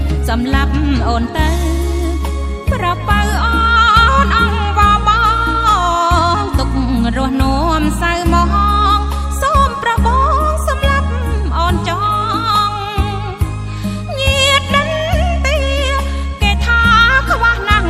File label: Khmer Song